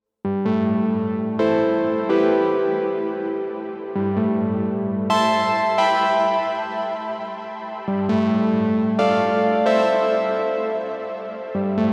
Arturia V Collection X Mini V4; Sapcious Hammered

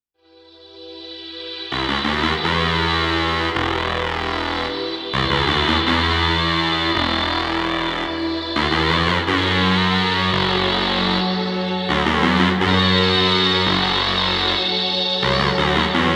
ARP Fuzz Guitar

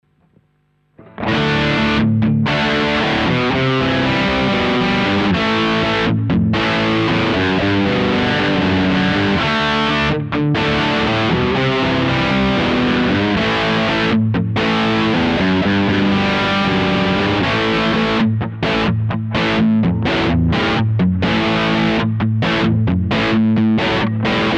Overdrive Channel plus Boost

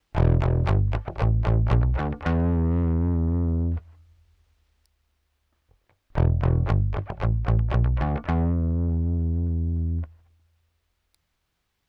Clean EQ Drive 100 Blend 50

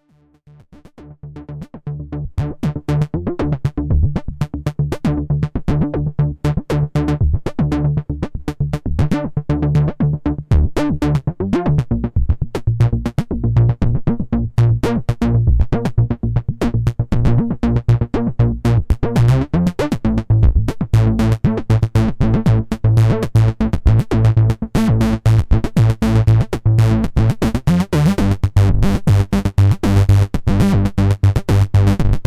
pulse solo sequ

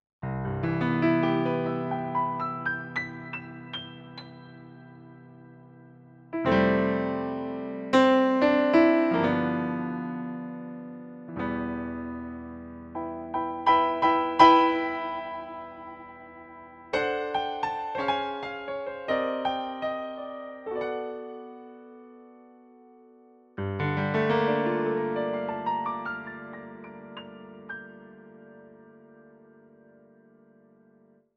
CP40 Piano

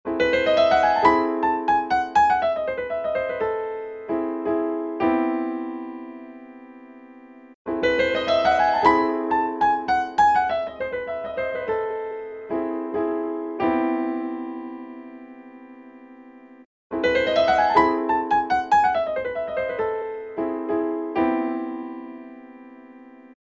Acoustic Piano

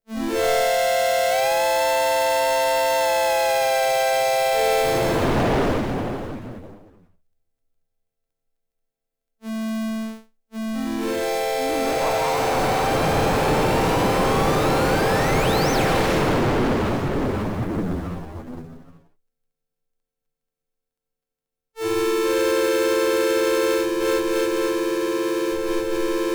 Lyra 8 Drive and EQ

